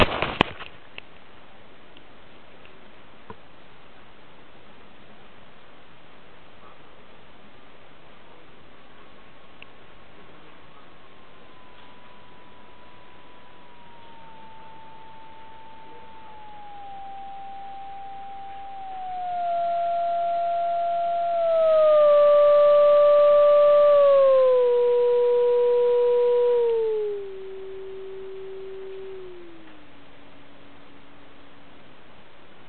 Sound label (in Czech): Filtr QCX mini 550Hz